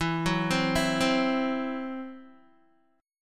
E Suspended 2nd Sharp 5th, Guitar; 11,9,12,9,12,x; Esus2#5 arpeggiated

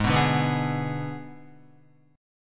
Ab Diminished Ab Guitar 4F,4A,5C,5G,5C,6E; strummed; guitar chord; 3,2,2,4,2,4; JGuitar

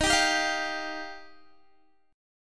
Eb Major Add 9th, Guitar; Ebadd9 strummed

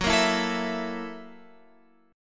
Gb 7th Sharp 9th Gb Guitar Open F; Listen to Gb7#9 strummed

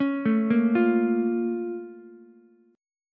Bb Minor Major 7th Bb down Ukulele, BbmM7 chord; arpeggiated; JGuitar

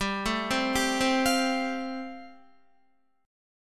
G 7th Suspended 2nd Suspended 4th G, Guitar; G7sus2sus4 arpeggiated

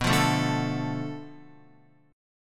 B-Minor 7th Flat 5th B down Guitar; Bm7b5 strummed

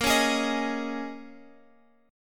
Bb Minor 11th, Guitar, Open F; strummed; x,x,10,8,11,10

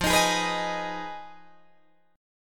F Minor 7th Flat 5th F down Guitar Drop D

